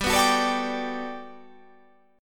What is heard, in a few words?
G Major 9th, G, down